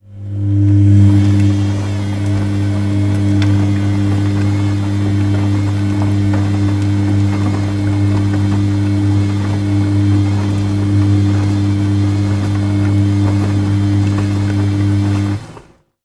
household washing machine short single washing cycle